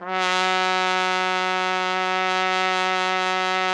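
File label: trumpet; Roland - LA Composer vol. 3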